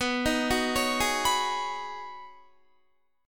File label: B 6th Add 9th B Guitar, chord; B6add9; arpeggiated; 18,18,18,18,20,19